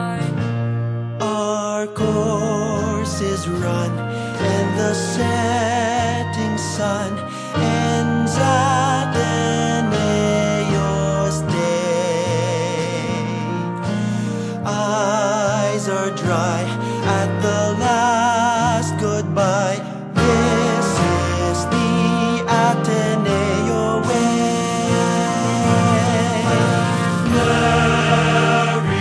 Hymn

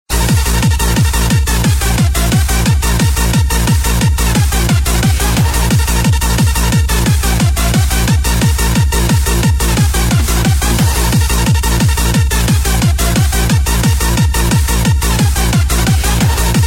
Fast Beat

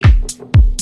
Bass

